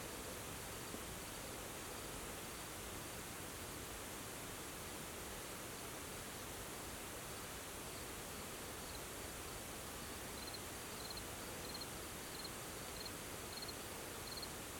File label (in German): Feldgrille; Zochauer Heidepfad Parkplatz